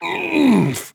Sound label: Orc Cleaver hit; 普通兵种